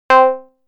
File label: Multiwave Lead